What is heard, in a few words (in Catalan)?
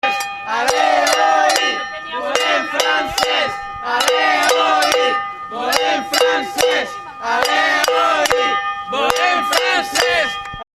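so ambient manifestació; EOI; RIBERA D'EBRE; FRANCES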